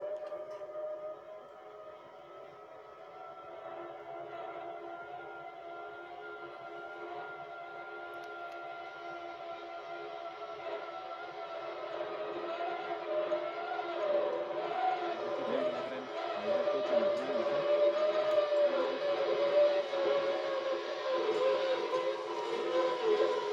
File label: Cable canopy Monteverde